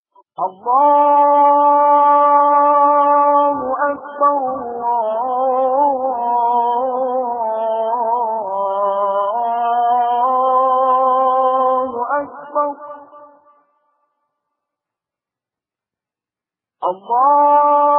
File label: azan, haram sharif